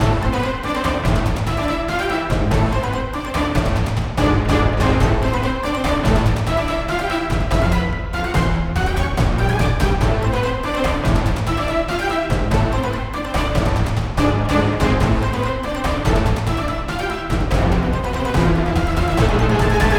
Music Boss Tank; Shadow Bug

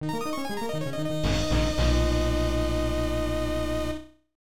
Win In Battle

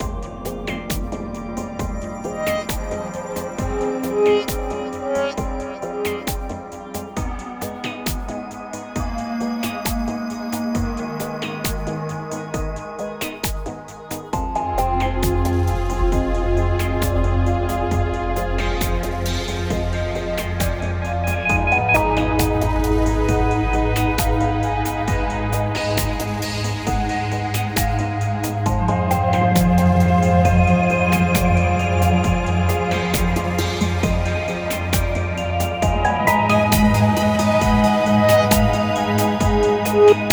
Underwater

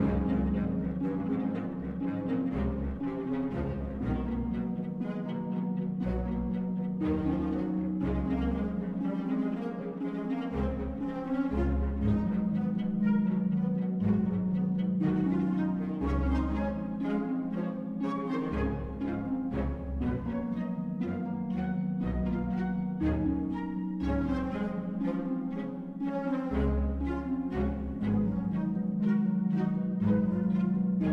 Music Dialogue Neutral 60bpm Loop

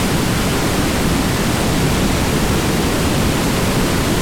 Wave Face

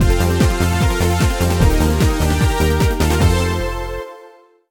game over; Road Fighter

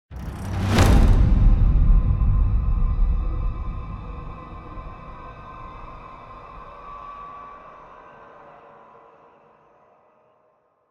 Transition To Combat; project zomboid

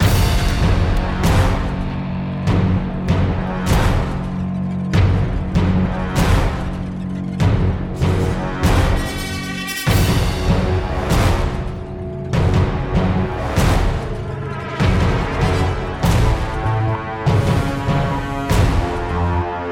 Battle Loop; Infinity Blade 3

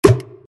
Rat Shoot; gamerip; The Fancy Pants Adventure World 3

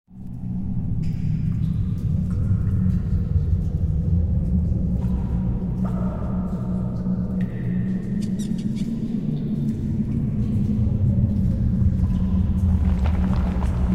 ambient dungeon; gamerip; The Warlock of Firetop Mountain

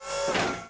Meca; Puddle

